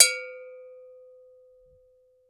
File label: GANKOKWE, African Percussion; E-MU Producer Series Vol. 7 – Old World Instruments